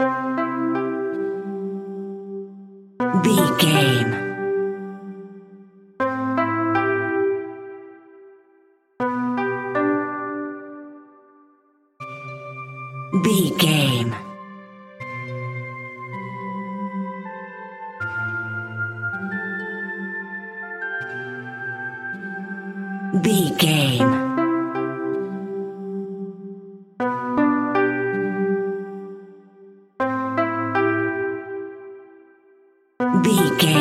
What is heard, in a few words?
village daybreak